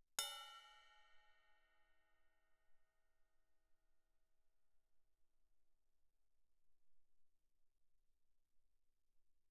crash stick bell mf, suspended cymbals; Percussion

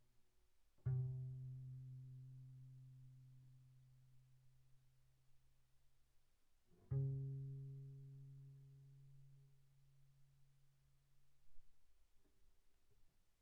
Bass pizz sul G pp C3 Bb3, double bass

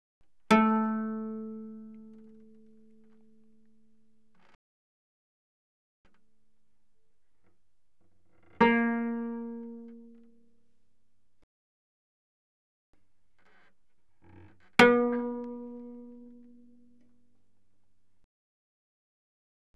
Cello pizz ff sul A A3B3; Strings

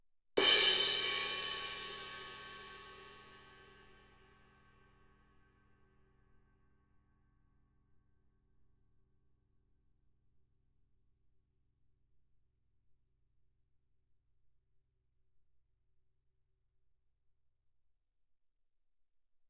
crash stick shoulder ff, suspended cymbals; Percussion

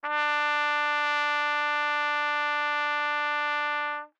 Trumpet novib ff D4 stereo, Bb Trumpet; MIS Pitches - 2014; Brass

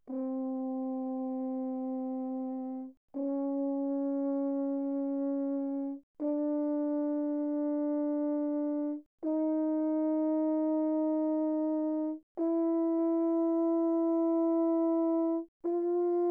Horn, pp, C4 B4, french horn; Brass

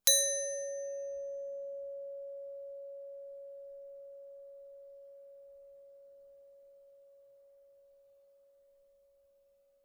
bells brass ff Db5 stereo; Percussion

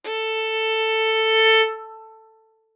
Violin arco ff sul A A4; MIS Pitches - 2014; Strings

